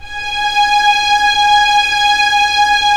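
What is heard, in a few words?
string section; Roland - LA Composer vol. 1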